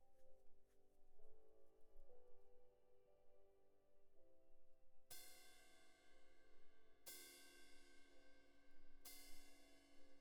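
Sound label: cymbal close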